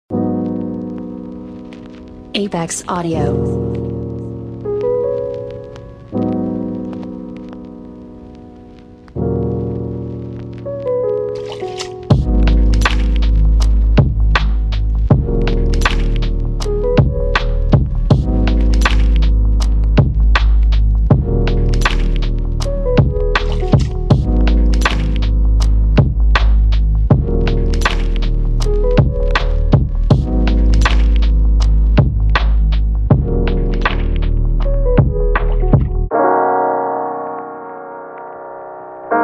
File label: Lofi Hiphop; Apex Audio; Fortuna